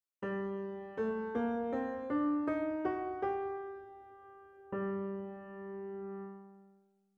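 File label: G minor scale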